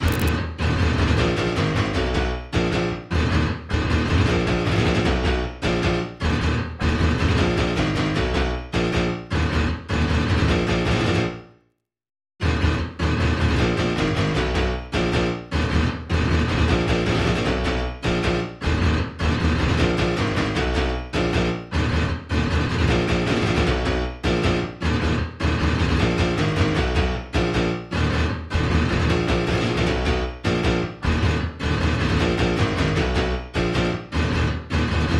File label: Drop Bass DRY